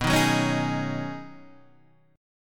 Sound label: B 6th Add 9th B down Guitar Half Step Down; chord shape